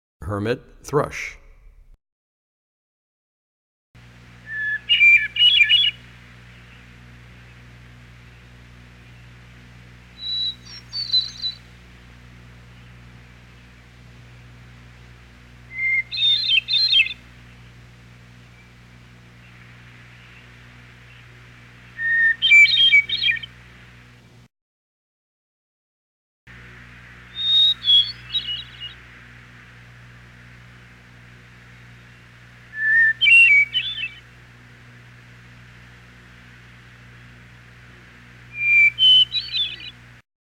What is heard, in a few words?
Hermit Thrush; Animals; Birds; 101BirdSongs